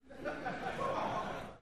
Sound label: Crowd 100 Short Medium, medium int